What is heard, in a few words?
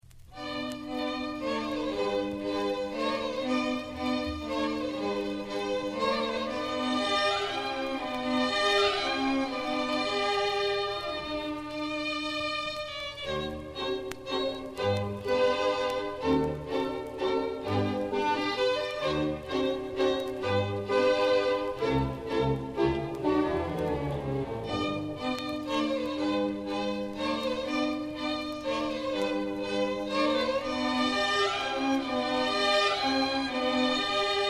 Minuet